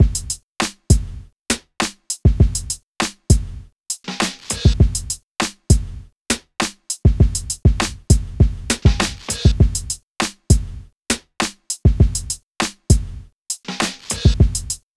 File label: Drums, Hip Hop